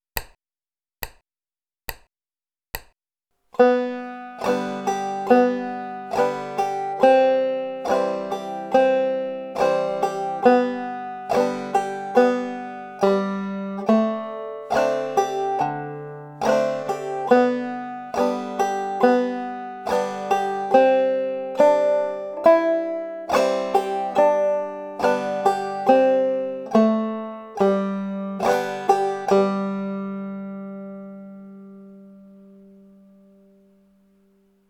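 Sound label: bum ditty, banjo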